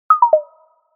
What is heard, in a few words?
Space Bass; Yezz Max-1